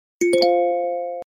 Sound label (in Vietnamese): nhac chuong tin nhan iphone 15 sms